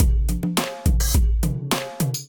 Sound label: Get Fresh Kit groove 105 bpm, Drum Essentials; MIDI Clips; Ableton